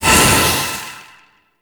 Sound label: Void Release Blunt; Weapons; Warframe; Duviri; Incarnon Adapters